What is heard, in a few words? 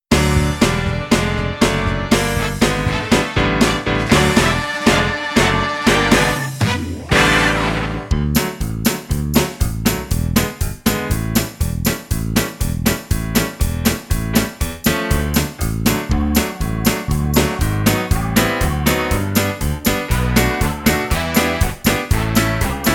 Out In The Forest Finale Instrumental